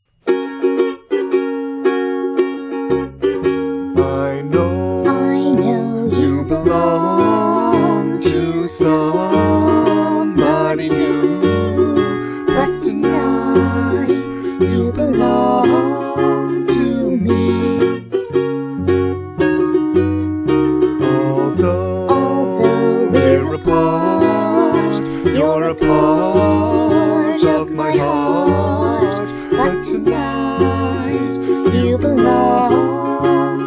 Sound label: Singing, Ukulele, Cello